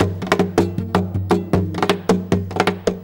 GUIT M KNOCK; Best Service; World Colours

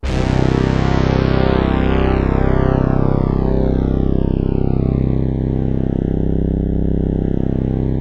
BRASS KIN, MORPHEUS; Syntec; Wall of Sounds

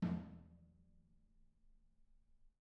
R B Floor Tom A Room; Sonic Foundry (Sony Creative Software) - Drums from the Big Room The Mixes; R&B One Shots

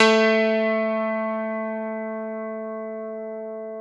PNO, Electrics, Grands; CP70; Club-50 - Foundations Roland

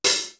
HIHAT; East West; Global Sounds

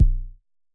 Basic Kick B0, Dirty Kicks, Vermona Kick Lancet; LookIMadeAThing Kicks

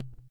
THROAT; Spectrasonics; Metamorphosis; THROATERS

